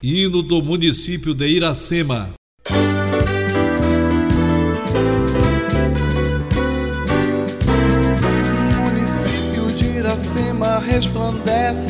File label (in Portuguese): forró